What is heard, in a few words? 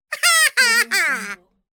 cartoon character sarcastic ha ha ha